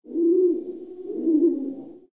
dove; Source Engine; tf; rottenburg

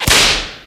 glove snap; taunt; player; yeti standee; medic; source engine; tf

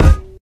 physics cube

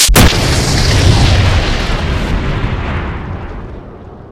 electric explosion; ambient; source engine; hl2; labs; video games

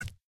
heavy taunt chest hit

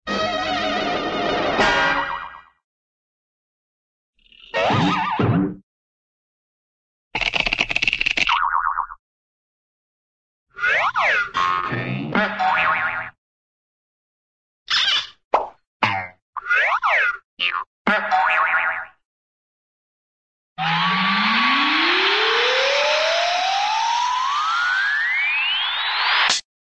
boing, cartoon; winnie's spell

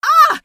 explo agh; episodic; episode 1; Source engine

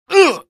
pain, barney; hl2; Source engine

